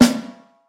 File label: subtle reverb snare single hit b key, steel snare drum